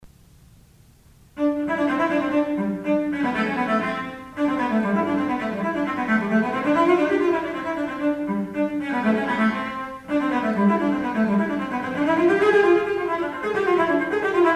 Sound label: cello